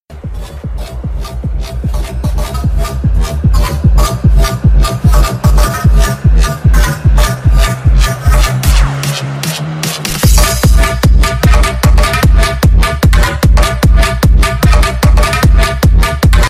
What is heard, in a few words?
hardbass